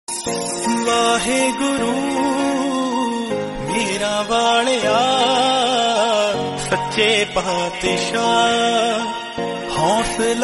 shabad, Satnam Waheguru

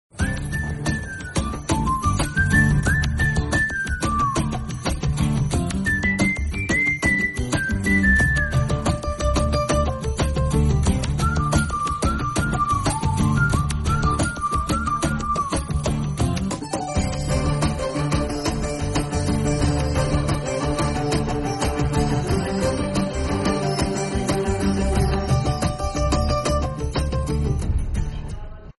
train bgm instrumental; sita ramam

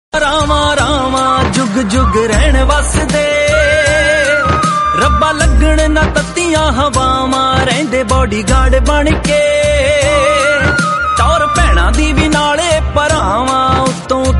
brother day song punjabi

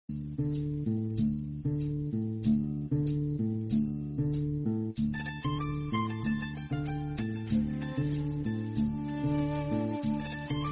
bgm theme; paparico

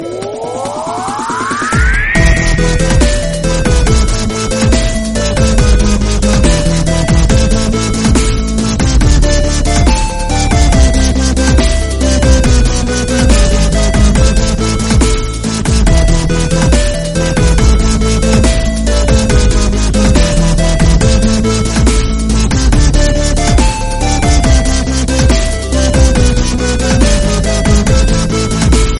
dubstep; tetris

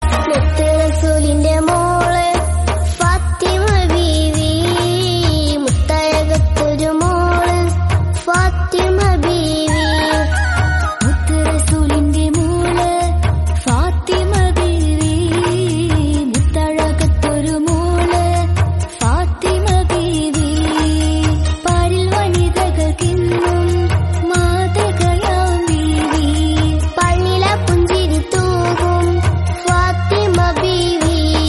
mappila pattu malayalam song

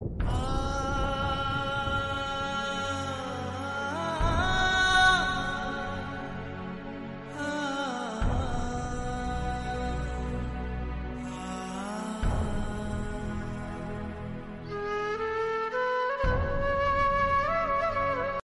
hindi serial song; pavitra rishta

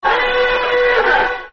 alarm beep double

